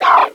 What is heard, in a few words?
shreek; DS; ML BOWSERS INSIDE; Dark Star